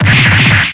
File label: machinegun; Machinegun jab